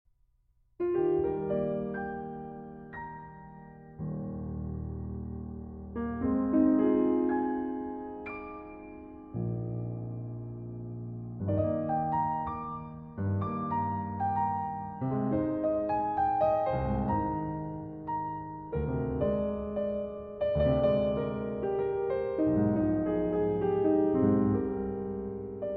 Piano Poems

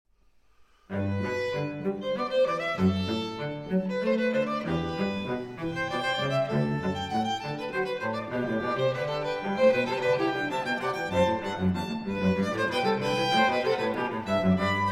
Canone all'Unisono; Goldberg Variations, BWV 988 No. 4, Variatio 3; a 1 Clav.

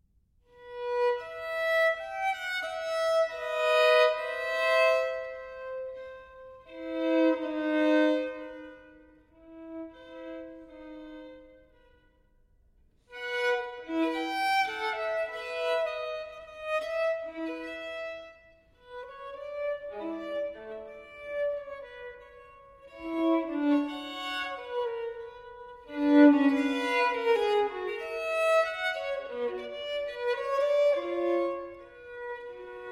Solo Violin in F Minor Adagio; TWV 4016; Telemann Fantasia

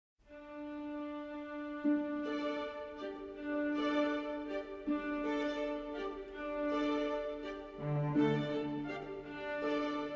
Instrumental

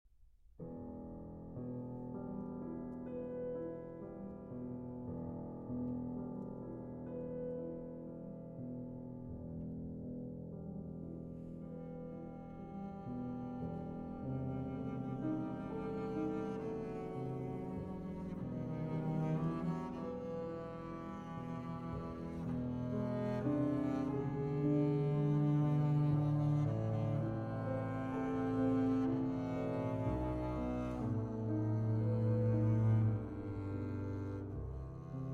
Piano and Cello; 5 Morceaux de Fantaisie, Op. 3; Arr. For Piano and Cello By Gjorgji Cincievski; No. 1, Elégie

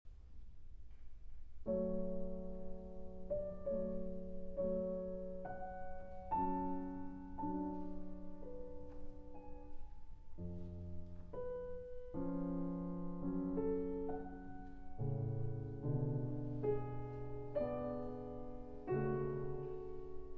Piano Concerto in A Major, Adagio (Live)

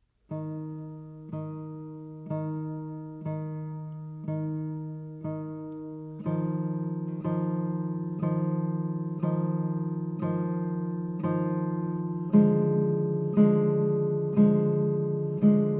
Guitar; J.S. Bach Concerto in D Minor, BWV 974 II. Adagio; Sérgio Assad

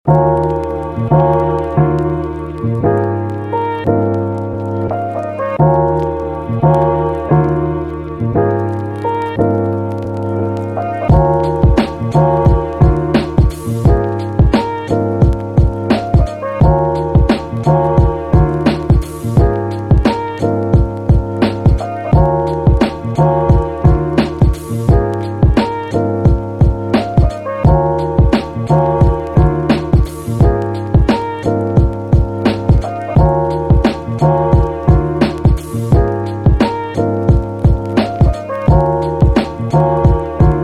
Nature Rhodes

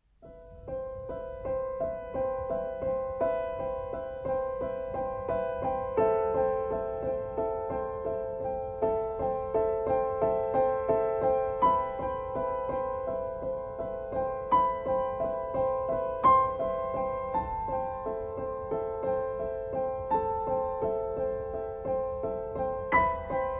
Spring Drops

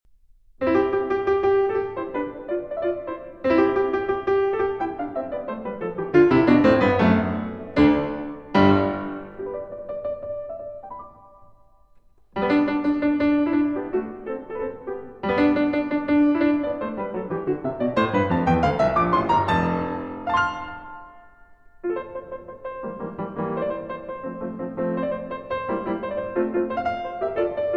Fantasia in C Major, Presto di molto

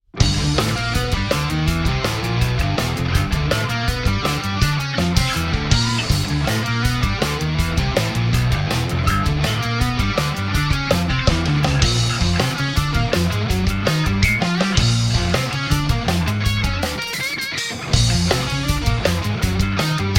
Swamp Stomp

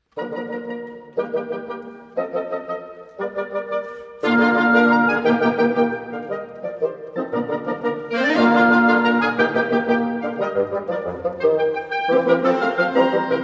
Divertimento in B-Flat Major; Mozart Divertimento in B-Flat Major, K. 196f - I. Allegro

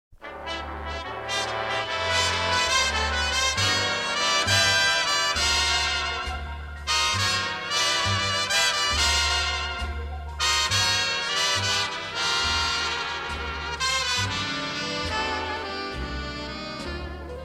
Orchestra, Riff Blues

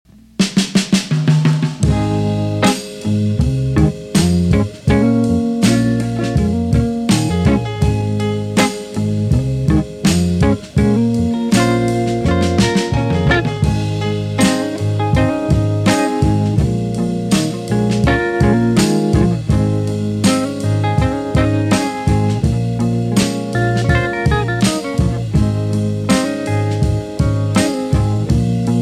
Instrumental

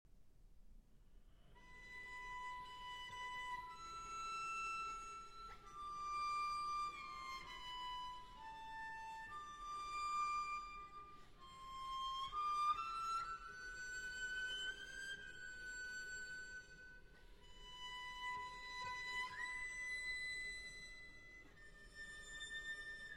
Piano Trio in E Minor, Andante - Moderato

